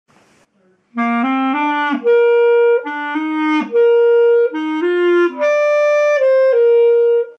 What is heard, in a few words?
String